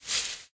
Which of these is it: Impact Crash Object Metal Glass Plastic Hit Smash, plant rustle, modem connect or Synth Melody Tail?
plant rustle